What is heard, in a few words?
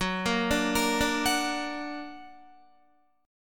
F sharp Augmented F sharp Guitar; F#+ arpeggiated